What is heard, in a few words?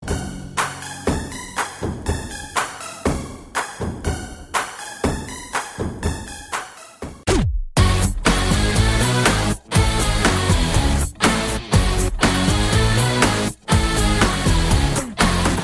Ring; Baadshah